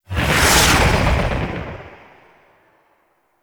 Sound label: Blue Sky Liset Fly By Quick, vehicles; Warframe; Liset Flight